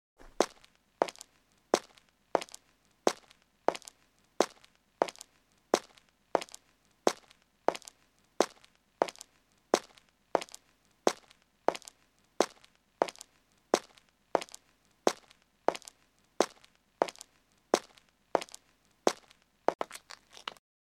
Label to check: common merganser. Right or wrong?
Wrong